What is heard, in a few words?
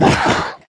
Earth dmg; Calerben